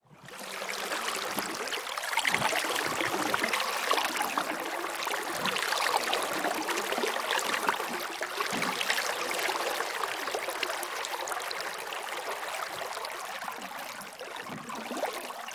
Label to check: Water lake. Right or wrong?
Right